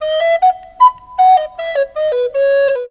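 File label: recorder toot; Musical Instruments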